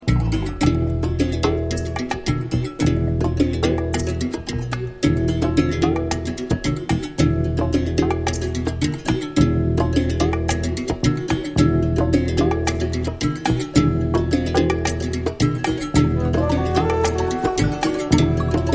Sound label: Berimbau Variations